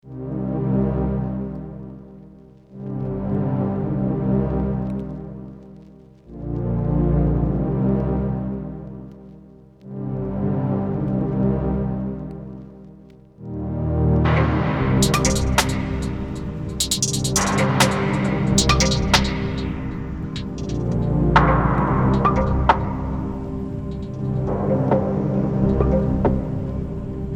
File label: URBAN